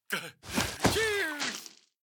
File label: symbol Cheers; robin hood